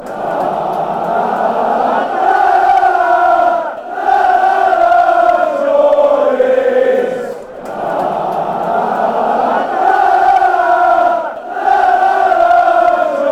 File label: na na geordies, fanchants